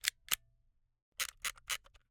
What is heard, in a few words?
Camera, Kinusa K77, 50mm lens, plastic, snapshot, wind film; Eiravaein Works; Vaeyan IV